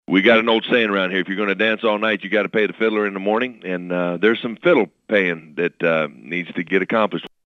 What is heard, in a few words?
Fiddle